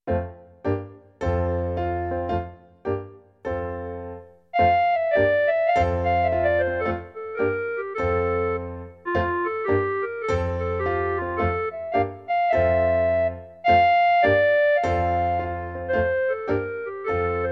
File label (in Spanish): CLARINETE; Sumer is icumen in